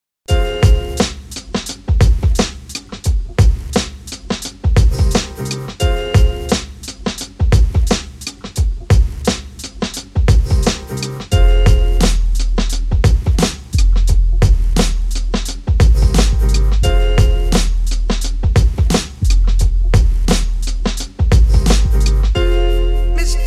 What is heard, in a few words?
beat